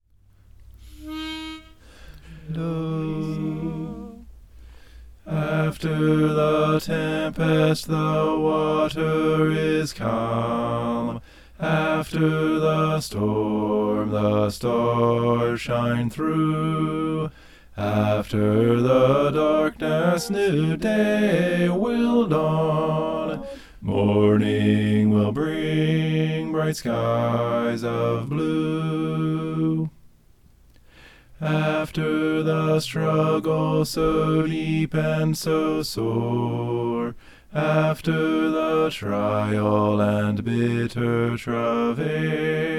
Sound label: bass